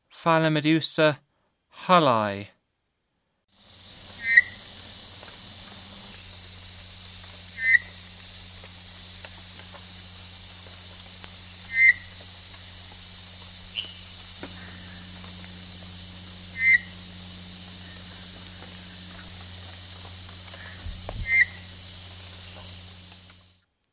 Phyllomedusa hulli, Frogs of Ecuadorian Amazon; S. America

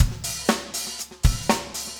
funk, 120bpm, loops

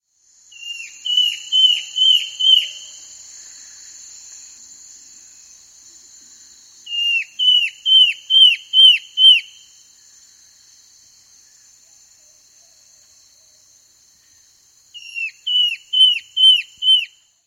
Slate-Colored Antbird; S. America

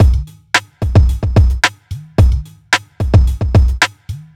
r n b kit 110

